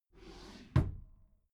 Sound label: desk drawer close regular